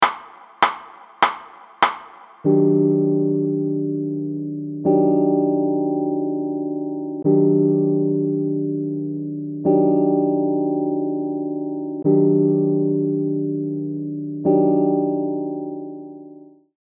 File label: chord